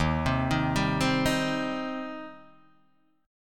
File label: D sharp 6th, D sharp, down, Guitar, Open D